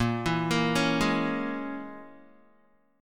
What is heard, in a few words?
Bb Minor 7th Flat 5th, Guitar; arpeggiated; 6,7,8,6,8,x; 4E,4A,5D,5G,5C,6F